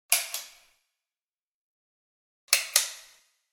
Pull Chord Switch, hanging pull chord switch pulled on and off.; Clicks; Objects